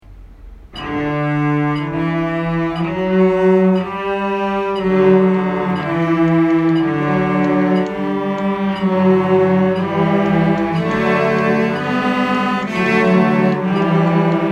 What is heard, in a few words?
kaeru